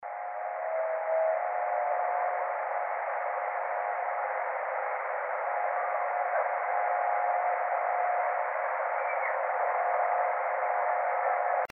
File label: Howl, bigfoot